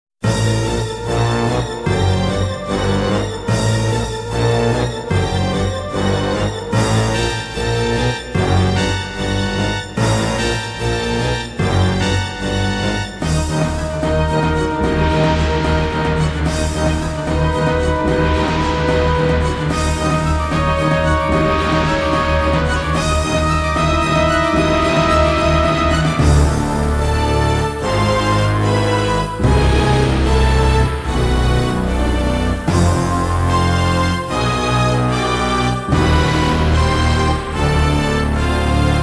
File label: Distortion; Ogre Battle 64: Person of Lordly Caliber original soundtrack